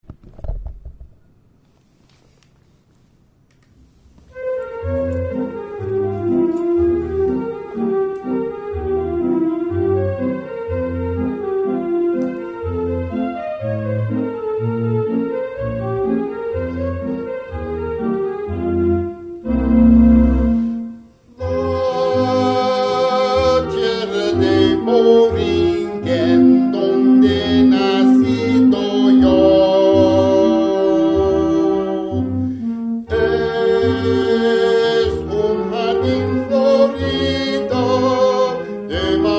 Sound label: La Borinqueña Vocal